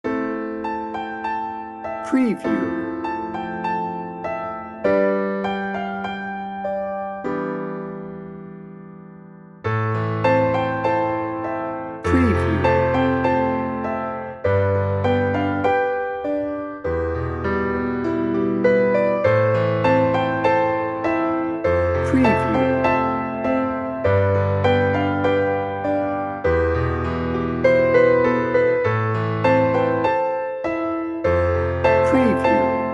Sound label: warm reflective piano orchestral piano only